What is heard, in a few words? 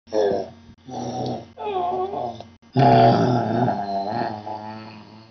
grunts